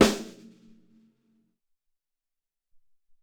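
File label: Rattle Snare 2, Alesis SR-18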